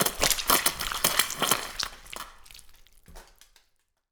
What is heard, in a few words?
post grenade fleshy debris, violence; Benny Placeholders